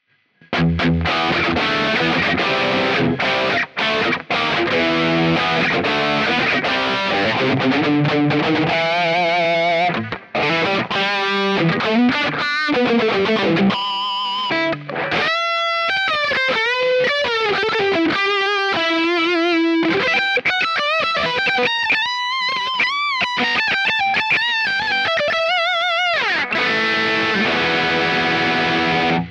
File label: drive